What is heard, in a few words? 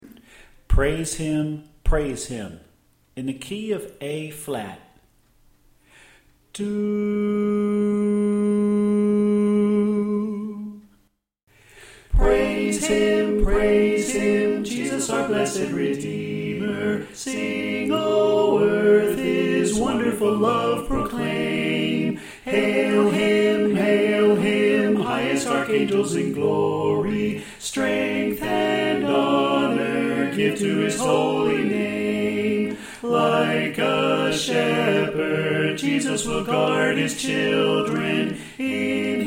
Tenor Left